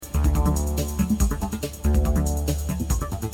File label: ringtone